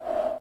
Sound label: fade; hours